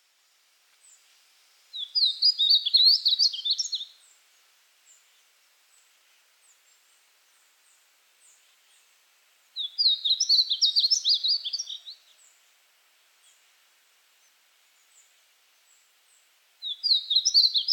Painted Bunting Passerina ciris ciris

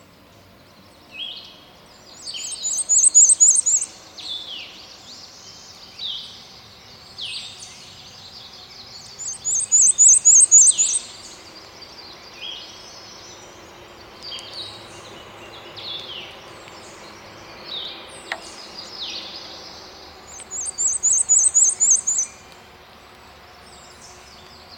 Black and white Warbler Mniotilta varia

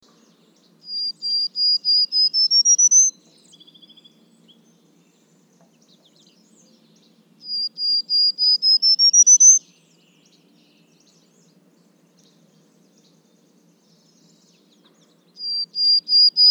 Prairie Warbler Setophaga discolor

